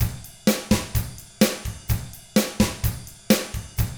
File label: 127 SURF ROCK